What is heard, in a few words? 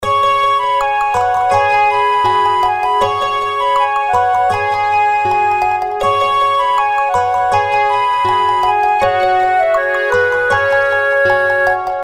Far East, ringtones